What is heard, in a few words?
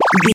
retro powerup collect